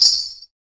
PRC METAL, Afro Shakers, Ankle Pods

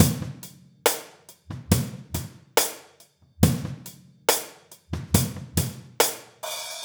SloMo Beats, Loops 140 BPM; Vengeance - Essential Clubsounds Vol.4

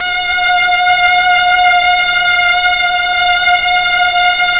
STR, Melo. Strings, Slow Strings; Roland LCDP09 Keys of the 60s and 70s 1

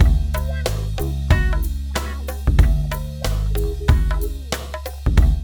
mix, funky loops; East West; Funky Ass Loops

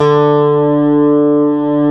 GTR CH STRT, Clean E.Gtr, Ultra Sheen

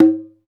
CONGA, Roland; Hybrid Kits; Club-50 - Foundations; Thin

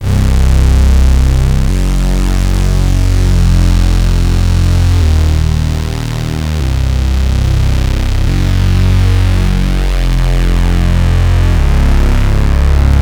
C64 Slow Bass Filter, Commodore 64, Chiptune

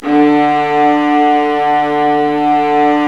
Violas Bright, String Sections; Roland LCDP13

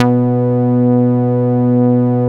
MOOG B3MF; 90S MOOG

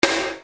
Snare Dr

